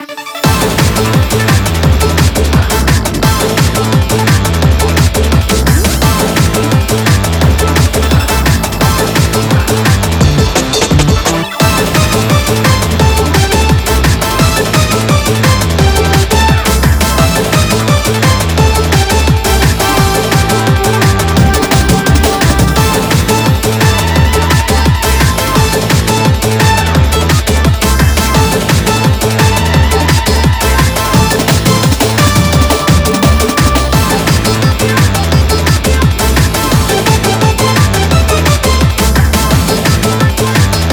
Bgm Bomb

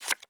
Poker Card Open

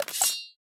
PickUp Sword; Going Under

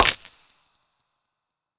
UI Menu Coins Select

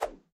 Swing Small

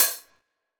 BGM DRUM

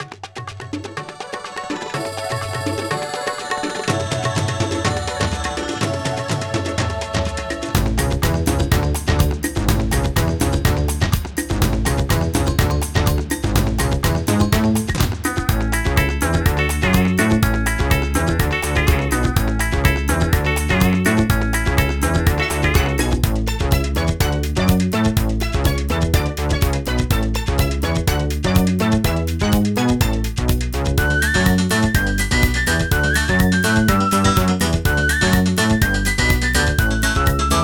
Conga

